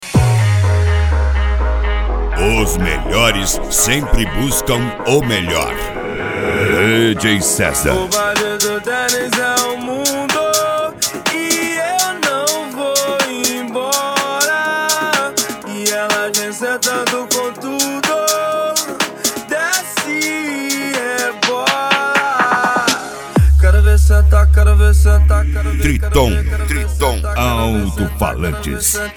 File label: mega funk